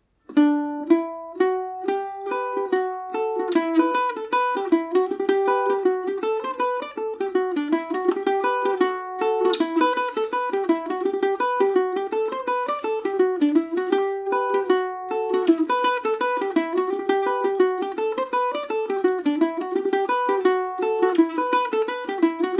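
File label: E minor, Irish mandolin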